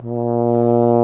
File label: BRS BARI, FRENCH HORNS; Roland - LA Composer vol. 1